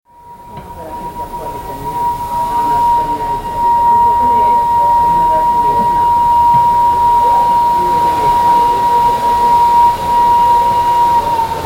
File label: factory museum